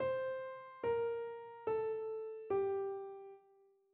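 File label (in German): Tetrachord Dor c ab, dorisch; Tetrachorde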